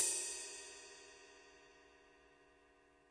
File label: CYM RIDE, DRUMS SINGLE HITS